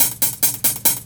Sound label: HH LOOP, 140 BPM; Ueberschall; Drum & Bass Resonance